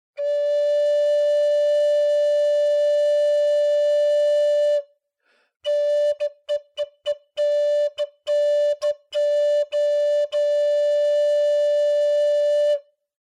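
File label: D American Flute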